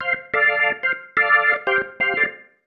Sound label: Single Riff, Organ; Loops; Single Riffs; Sonic Foundry (Sony Creative Software); Joe Vitale Organ Donor